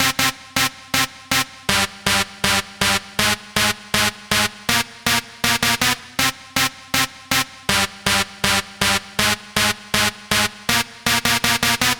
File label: Dance Synths, Hardcore Loops, Synth Loops